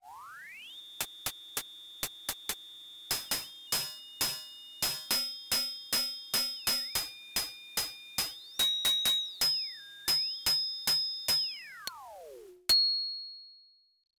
SFX; dragon knight ii; pc 9801

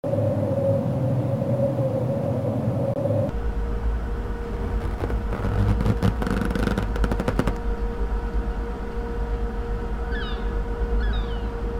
Balloon; Elevator Shaft

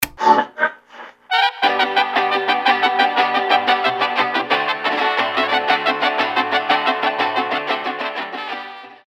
Radio Station; Agorian; Ratchet and Clank A Crack in Time

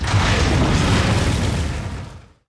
Door Slow

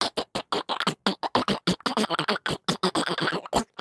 Fluke fly attack loop

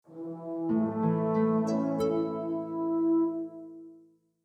Level Up; Sorcerer King

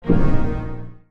UI Toggle

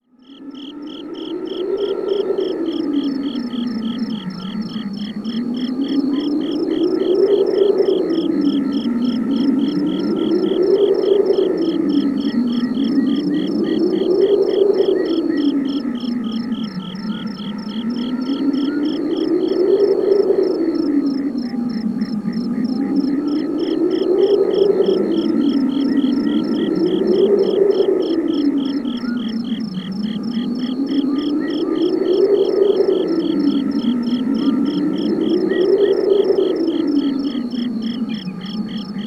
Ambiance; gamerip; Battle Arena Toshinden 3; playstation

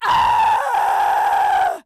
female scream lower

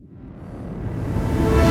Action Street; The Amazing Spider-Man 2

